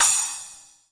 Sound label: Roland R-8 Crash